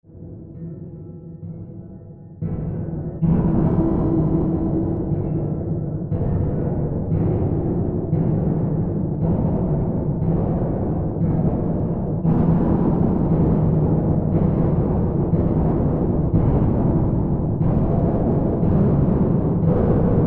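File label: metal Reverb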